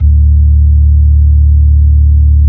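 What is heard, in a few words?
B-3, Hammond B-3 organ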